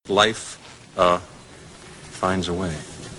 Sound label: Long Hook, wub wub wub; TF2Maps